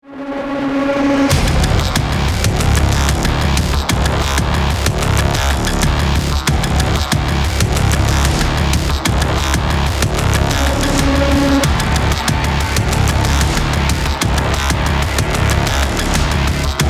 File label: Start; Doom 2016